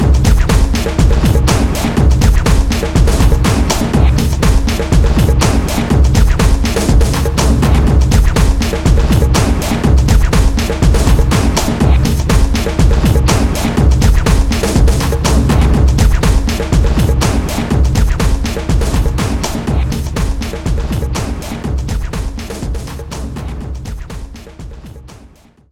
drumscapes; hot pixel; psp

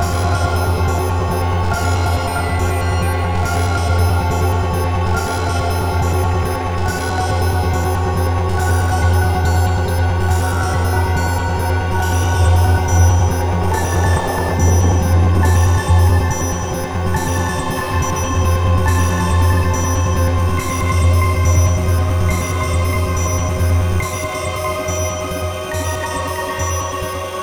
Glitch sonata Slow

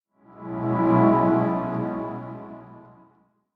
Stinger Menu Story

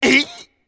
Character Knight Hit

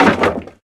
Jumble Tools

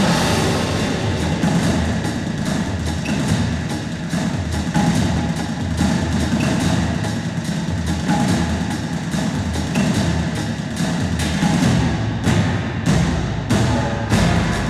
Music Loop; Choplifter HD

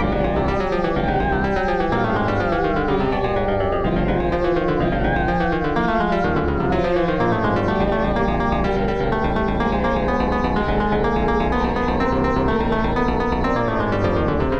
Dungeon Boss Music; The Captives Plot of the Demiurge

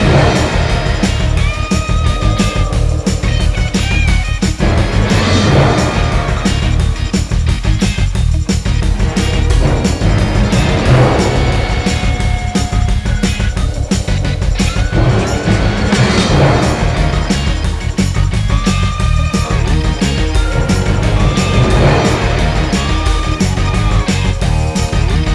Boss Fight, 178 Bpm Loop; We Happy Few

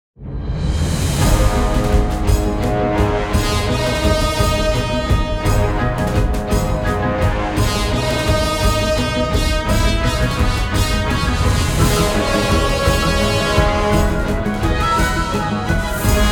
Boss Forest 170BPM; ps4; xbox series xs; Zozime

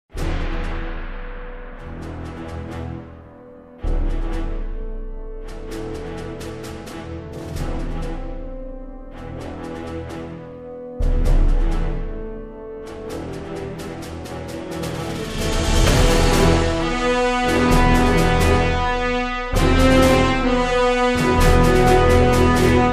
Dramatic Orchestra; Red Ocean; The Conspiracy

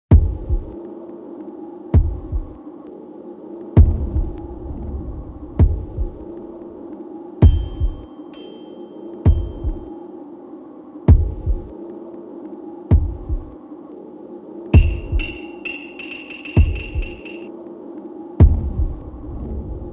Meditation

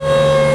bulldozer arm lift; Birthday Party Bash; Wii

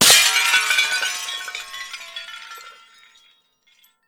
Shatter Big; blastmorph pinball; xbox series xs

